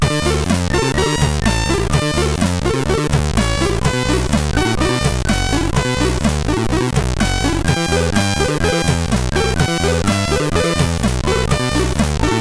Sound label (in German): Melodei, MOS6581R3; sid